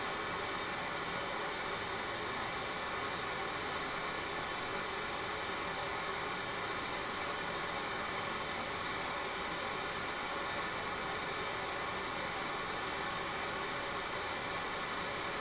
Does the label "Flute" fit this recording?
No